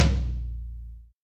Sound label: hi tom a sharp key; tom drum